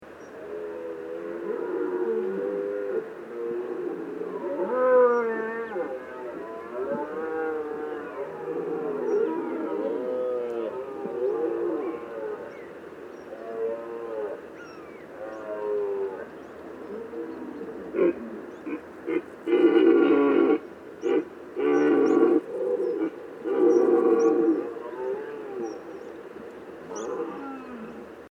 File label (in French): Brame